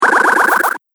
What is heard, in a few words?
Spell Blast Air And Water, magic sound effect